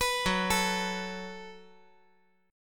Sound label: Gb Minor Double Flat 5th